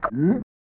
Monster Done Eating; total carnage; snes

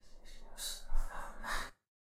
Hotel Whisper; silent hill homecoming 2008 gamerip pc ps3 x360

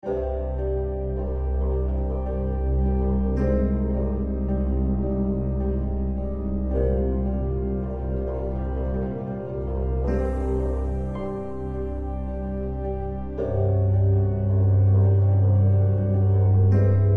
Eerie; the walking dead season one

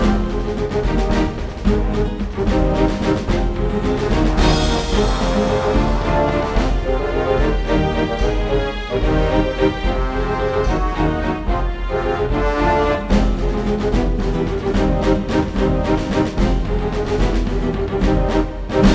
Duel Music Neutral; sid meier s pirates 2004 pc

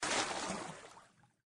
Water Splash

